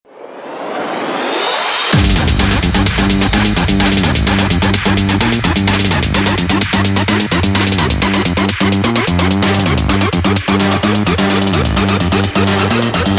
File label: EDM Synth Shots